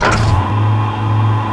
plat; quakemapping; mod slipgate; plats

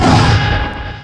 cloak; mod quoth; polyp

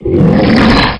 attack, shub; quakemapping

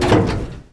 plat; plats; quakemapping; mod slipgate

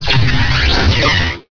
blink out; quakemapping; skullwiz

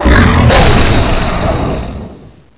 fire, drole; quakemapping; mod quoth

